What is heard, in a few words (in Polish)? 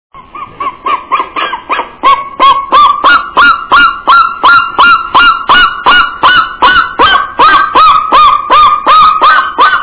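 orzeł przedni, głosy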